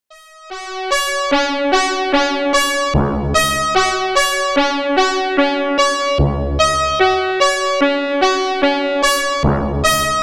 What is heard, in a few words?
fanfare